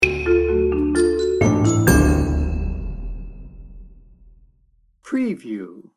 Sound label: playful pizzicato sting bumper